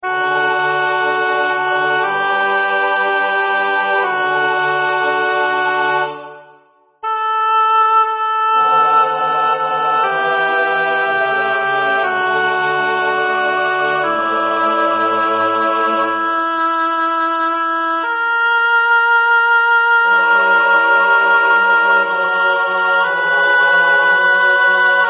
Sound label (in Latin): Agnus Dei Alto; Zelenka; Missa Paschalis